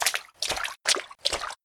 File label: water steps; AssaultCube